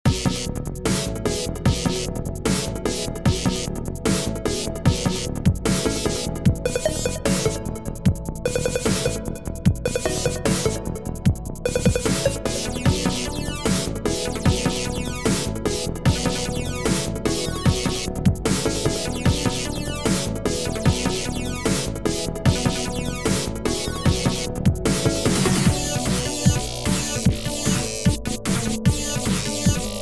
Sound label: electronic remix